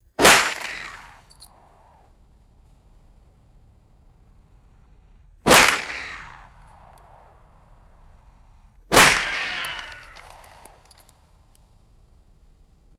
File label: small caliber revolver firing outdoors with ricochets; guns